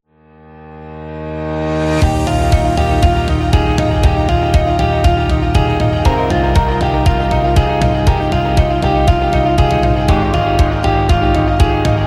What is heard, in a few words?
Motivational Corporate